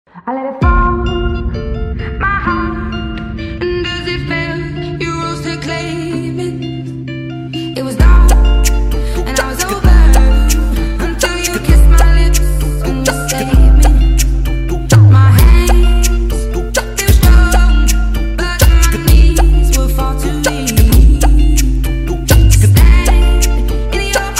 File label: BEAT FUNK REMIX